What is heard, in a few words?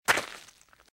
Footsteps - Gravel - Walk - Right; RadwinIsland